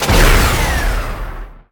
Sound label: Rocket Launcher Shot; SFX Kit; Weapons